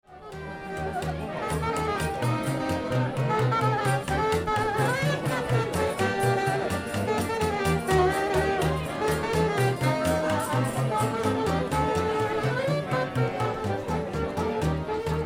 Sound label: Street Music Jazz Musicians, Jazz band playing on streets; Athens Greece; Concert Sound Effects; LynneMusic